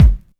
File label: natural bass drum one shot g key; Duration 0:01; Samplerate 44100 Hz; kick drum samples